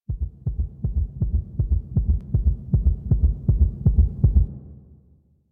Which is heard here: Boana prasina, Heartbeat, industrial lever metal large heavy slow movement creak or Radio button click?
Heartbeat